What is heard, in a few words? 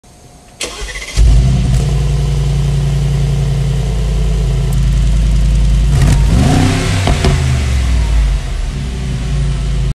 mercedes g63 amg; mercedes benz g63 brabus 700